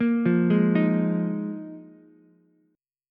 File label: D sharp Suspended 2nd Suspended 4th, Ukulele; arpeggiated; JGuitar